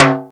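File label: Low timbale, Roland MC-303 Percussion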